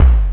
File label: thud; WalkerII